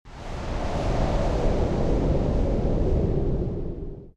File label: ambient port default; World Of Warships